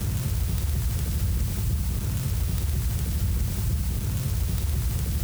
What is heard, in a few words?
Fire Elemental FireBall Loop; TANK; 3Souls